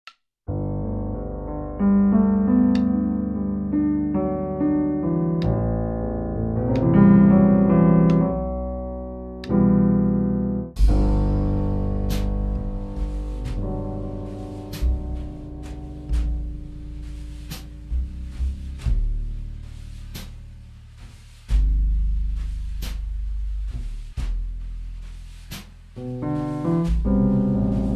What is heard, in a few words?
Relaxing Jazz